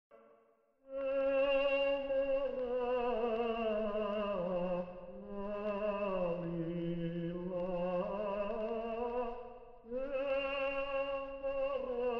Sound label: El Nora alilah